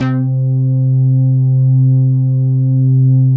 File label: WAVE; Masterbits - Tekkno Therapy